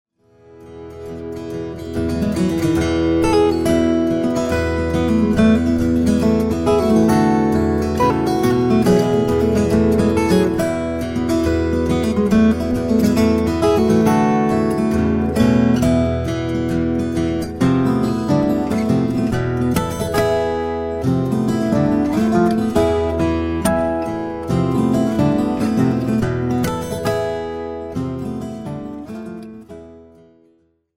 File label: celtic, acoustic duo